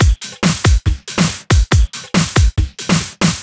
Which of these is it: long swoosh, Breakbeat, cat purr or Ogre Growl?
Breakbeat